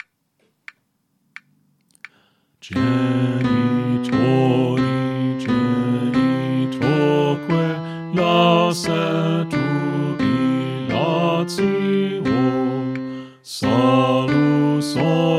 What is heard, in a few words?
Alto; Pange, Lingua, Gloriosi; St. Columba Choir Practice Tracks